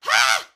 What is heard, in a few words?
"ha", Voice